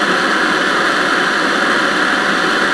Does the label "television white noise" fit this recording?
Yes